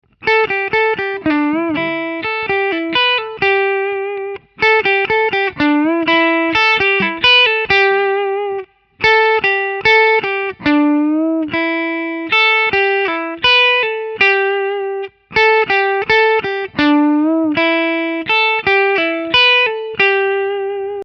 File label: Lick, guitar